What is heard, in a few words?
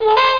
basic Flyer Appear; F.A.R.M. Patrol